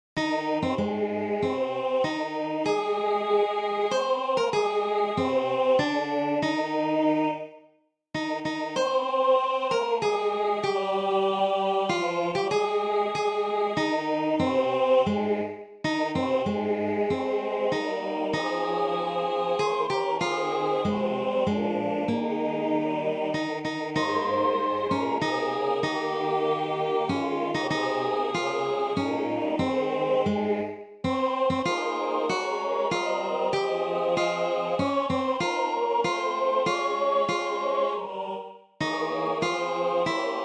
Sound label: National Anthem Alto